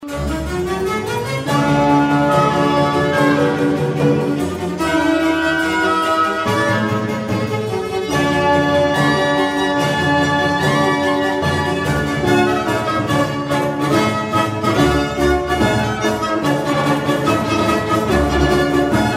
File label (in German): Sinfonie D Dur Adagio molto